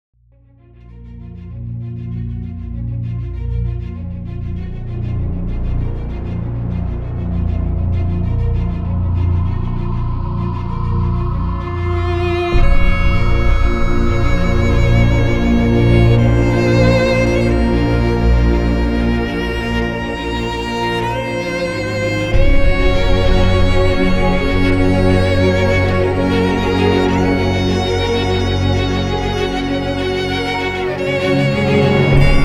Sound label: music trailer